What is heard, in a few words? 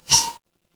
ui toast